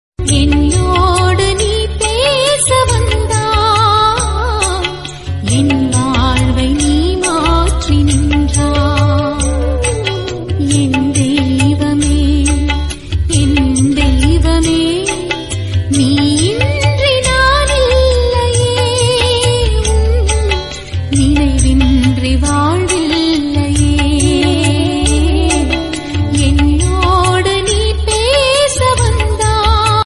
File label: devotional tamil